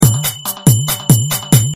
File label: 140 tribal